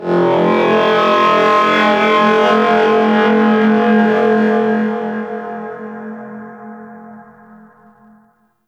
Temple Chant Texture; Corpus Ship Remaster; Temple One

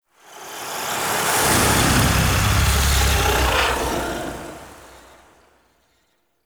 Transition Stinger; Warframe; Entrati Labs; Epilogue Cinematic

